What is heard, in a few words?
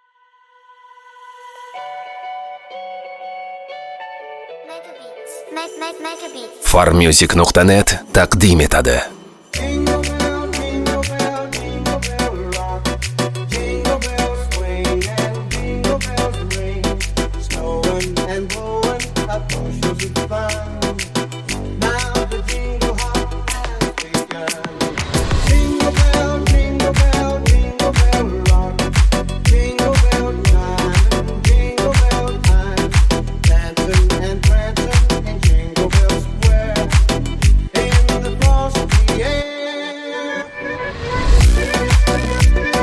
jingle bells